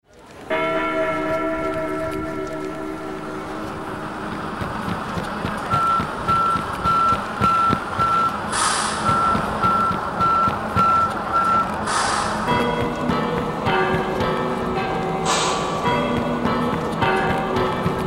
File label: city blues bells